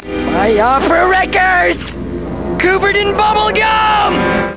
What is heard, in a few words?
opera